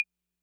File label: Clava Accent, 808